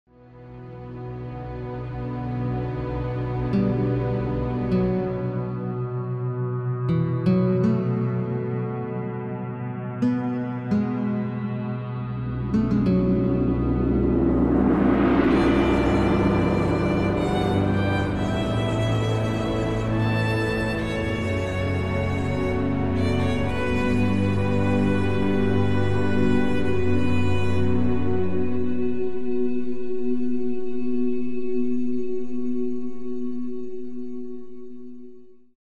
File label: Guitar Violin